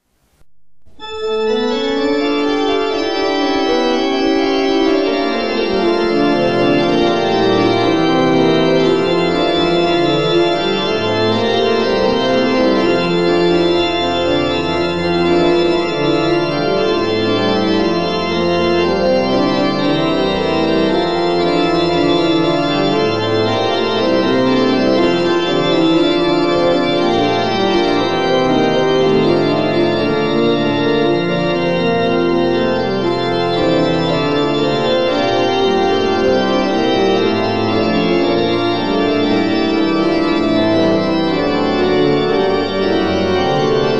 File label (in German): Choralvorspiel; Bach; Christ ist erstanden